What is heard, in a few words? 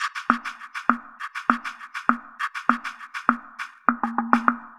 Sandpaper Groove; Electronica; Composite Beats